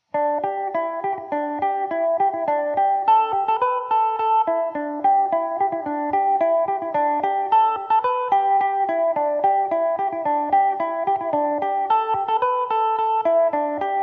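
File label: polka G major, Irish mandolin; mrs crowleys polka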